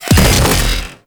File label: Wall Eye Explosion; Warframe; Sentient Devourer; Ambience